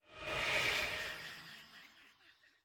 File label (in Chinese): 恼鬼待机声